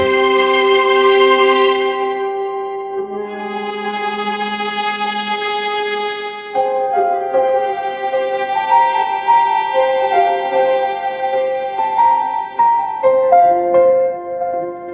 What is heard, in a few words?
orchestral strings, ringtones